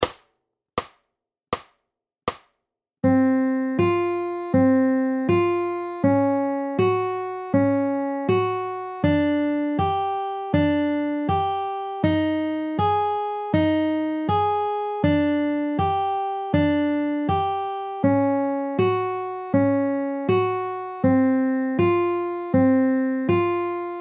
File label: Arp